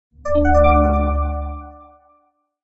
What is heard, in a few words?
completed